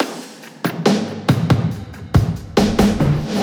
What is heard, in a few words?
Fills, Loops 140 BPM